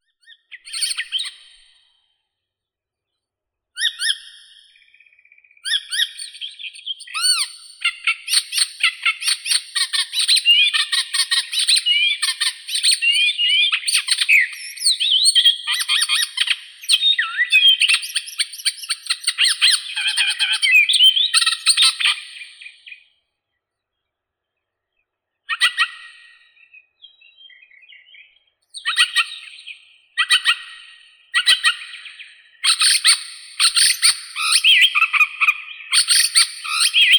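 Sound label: robin Erithacus rubecula, nature sounds; malinovka zaryanka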